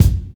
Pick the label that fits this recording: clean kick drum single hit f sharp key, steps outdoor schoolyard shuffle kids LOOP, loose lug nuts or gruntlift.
clean kick drum single hit f sharp key